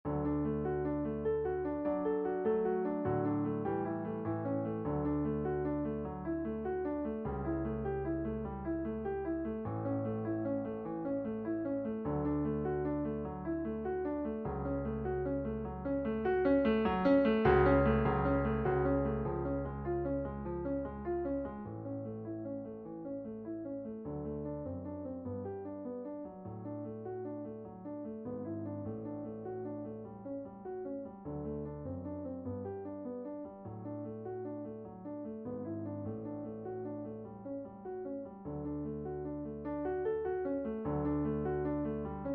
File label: PIANO; Auf dem Strom; shubert